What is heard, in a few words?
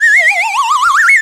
slide whistle; ui; birthday party bash; wii; Spanish